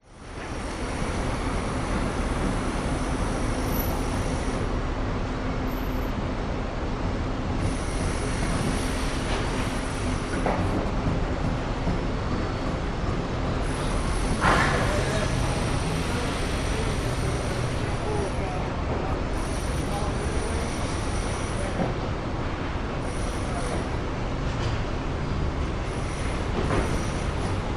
brisbane cbd adelaide street by construction site people traffic